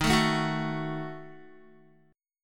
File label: D sharp Diminished D sharp, Guitar; strummed; chordshape; 10,12,9,11,9,x; JGuitar